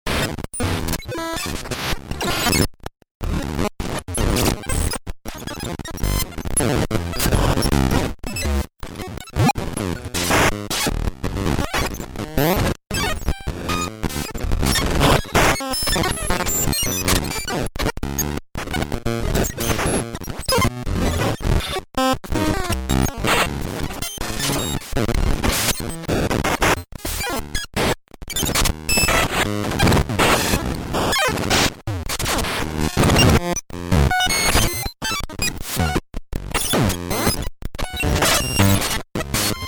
noise composition feedback; weeklybeats-2016

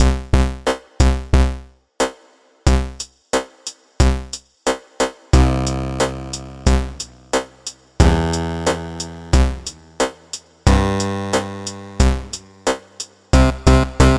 pitched noise

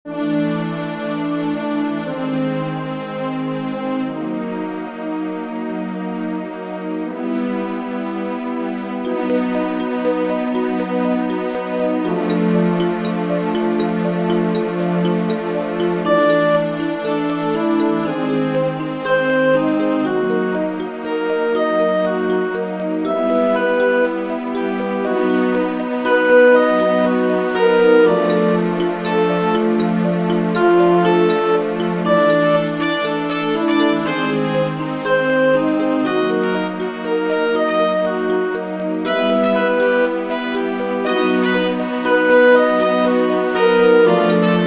orchestral; weeklybeats 2024

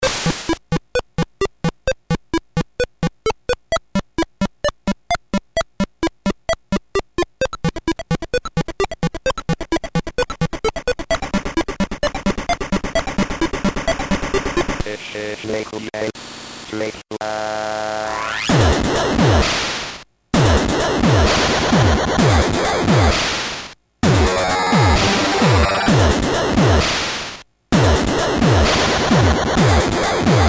lsdj dubstep with speech; weekly tweaks; weeklybeats 2012

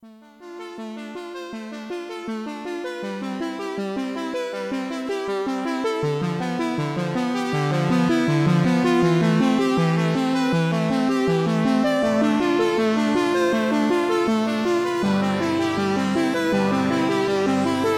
korg minilogue xd synthwave; phone booth in the rain; weeklybeats-2020

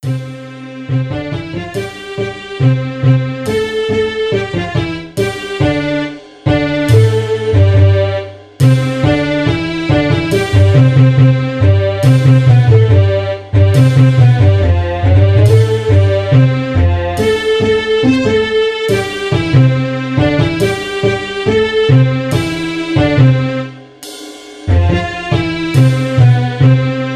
sax n violins; weeklybeats-2018

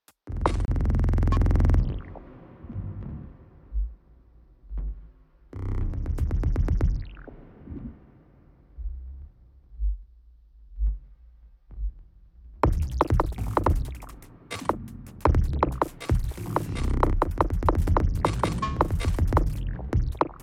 stutter exp 160bpm

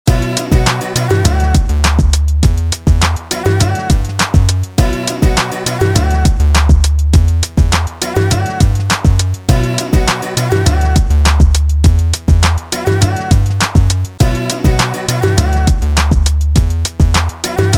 bring it back instrumental

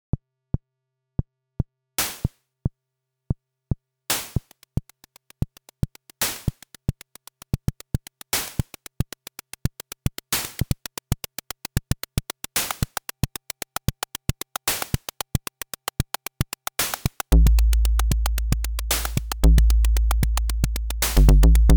light swing opl; weeklybeats 2024

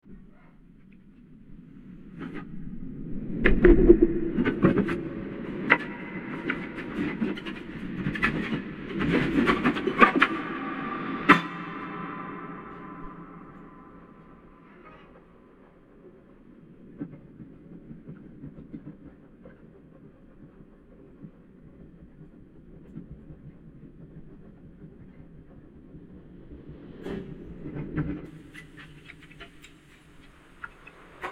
fieldrecording dsp washing dishes

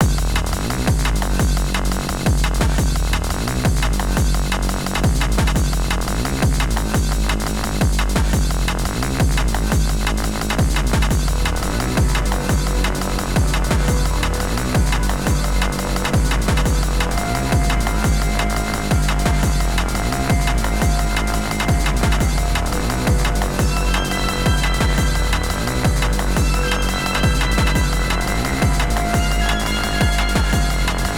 dnb practice xrns; weeklybeats 2026

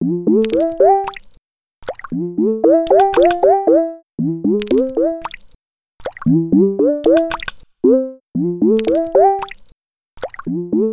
rain falling on; weeklybeats-2016

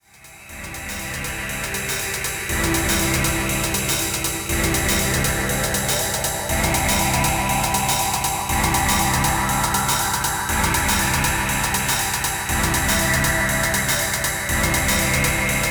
sweeshy swoosh; weeklybeats 2024

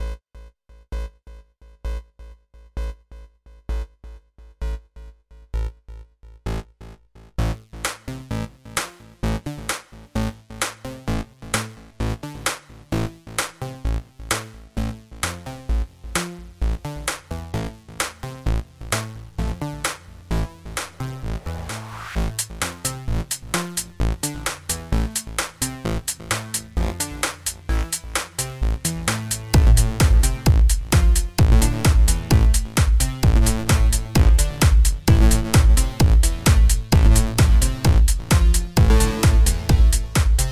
alarm

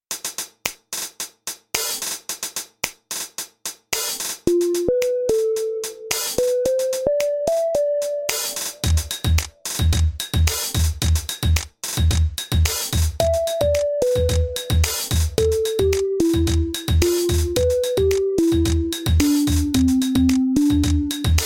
op xy

